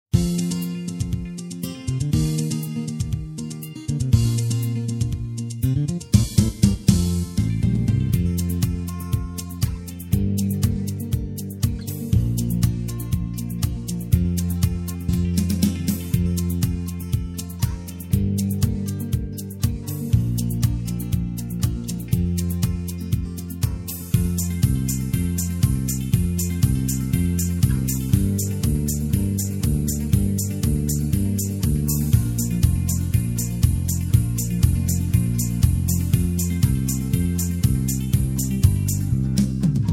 instr

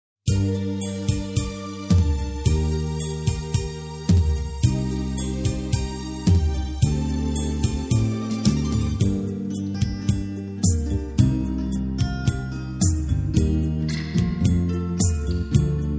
matka instr

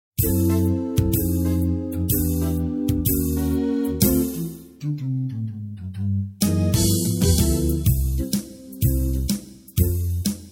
jezu przygarnij instr

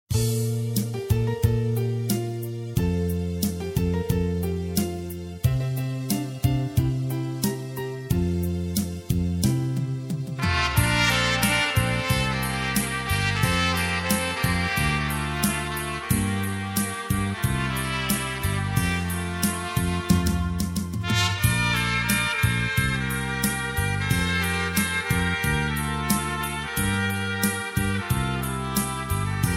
ufamy wierzymy instr

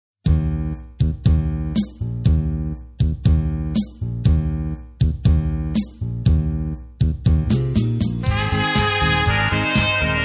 popatrz matko instr

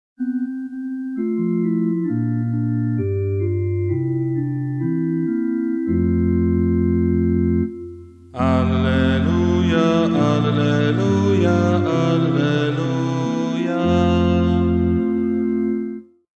Wielkanoc alleluja

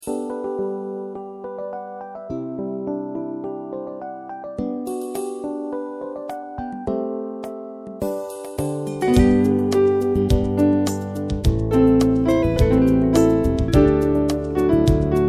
komu matko instr

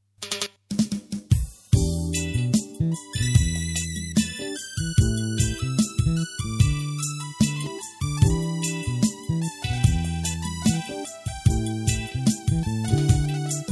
wybacz Panie instr

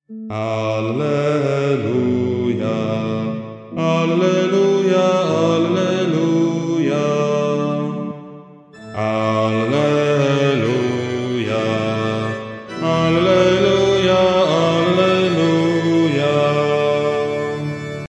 zwykly alleluja

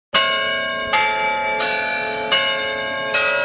dzwony

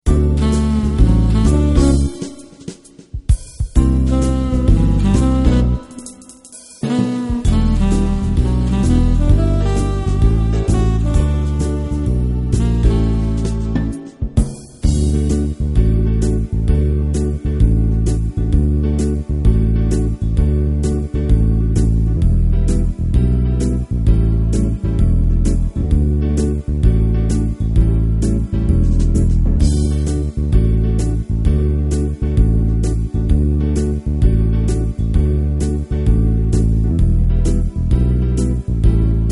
matko pocieszenia instr

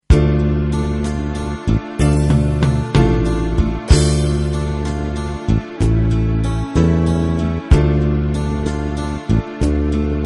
o maryjo tys instr

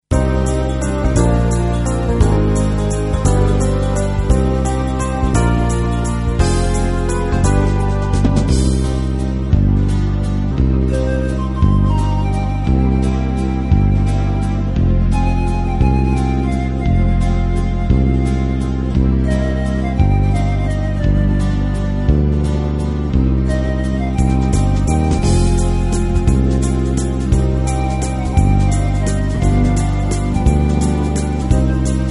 serce maryi instr

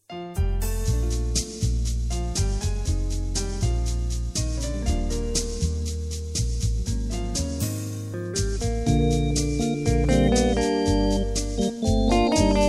maryja cudne instr

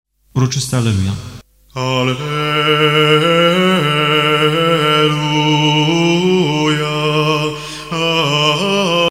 Uroczyste Alleluja

